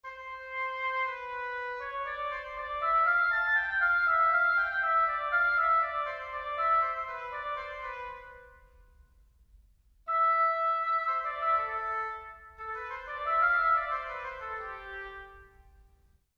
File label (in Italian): Pezzo strumentale in do maggiore; 1794 circa